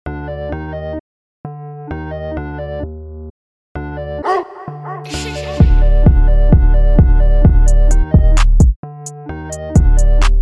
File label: BEATS